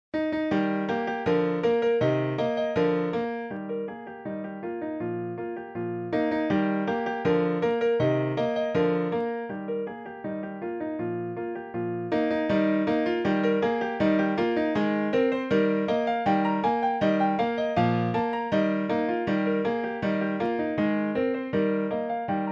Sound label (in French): Ecossaise Pour Piano; Ludwig van Beethoven